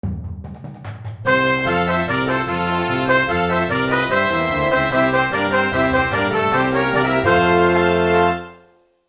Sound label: Cartoon Tag